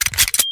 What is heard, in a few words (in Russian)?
korotkij shelchok pistoletnoj obojmi